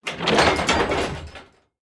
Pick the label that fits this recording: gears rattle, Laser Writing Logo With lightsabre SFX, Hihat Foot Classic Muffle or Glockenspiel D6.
gears rattle